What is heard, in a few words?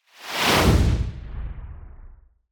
Fire Whoosh Speedy; Hollywood Edge; sound designer's toolkit v2